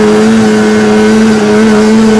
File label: rpm, sled; WhiteOut